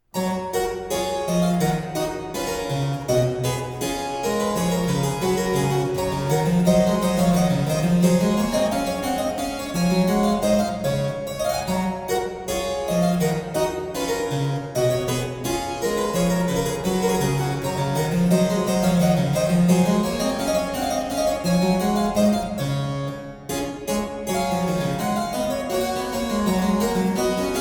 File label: French Suite No. 5 in G Major BWV 816 Gavotte; CANTUS RECORDS